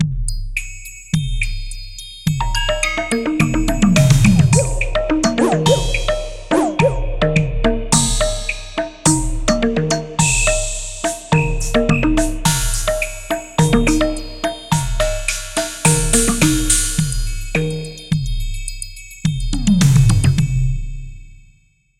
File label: Perc